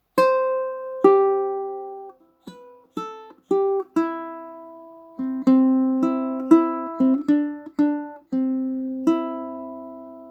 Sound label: Uke